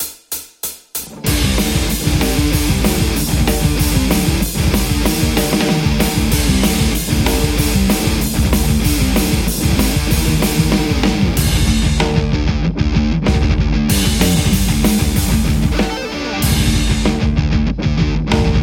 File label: Les Paul; metal; ik rockerverb50